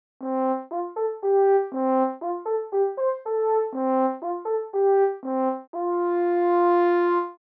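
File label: French Horn Dry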